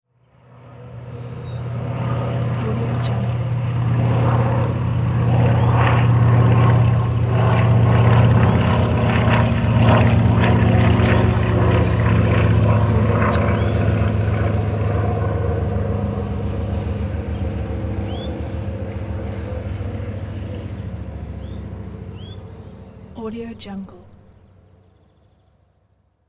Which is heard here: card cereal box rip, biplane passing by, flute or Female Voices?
biplane passing by